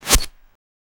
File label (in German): Dolch trifft Leder kurzes; mmorpg